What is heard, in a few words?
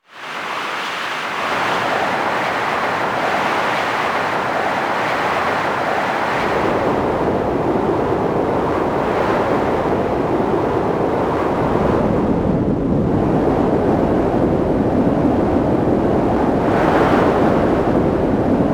Before the Boss (Ambience)